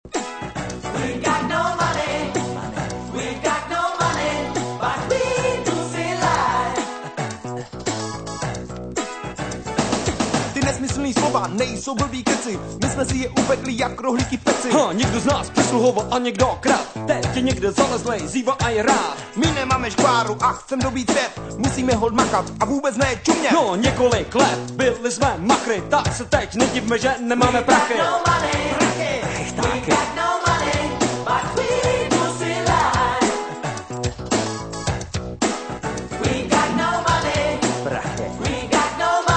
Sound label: RAP HIP HOP HOUSE MUSIC